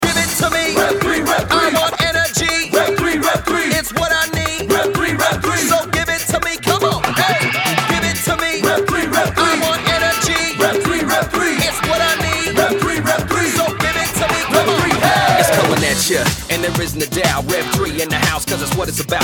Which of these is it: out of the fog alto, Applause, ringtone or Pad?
ringtone